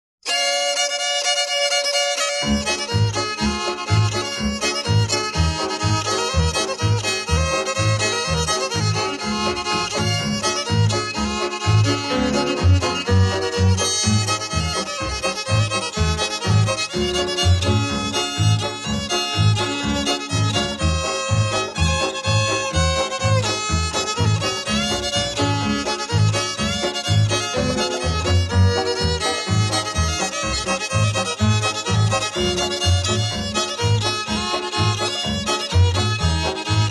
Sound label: breakdown fiddle extended, square dance music